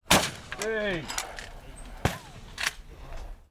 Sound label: sport clay pigeon release shoot hit cheer uk